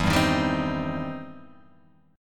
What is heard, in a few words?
E Minor Major 7th Sharp 5th E down